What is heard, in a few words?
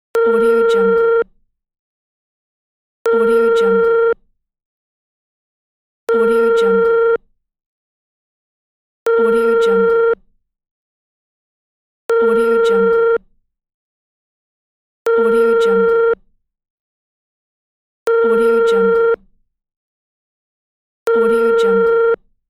european phone connecting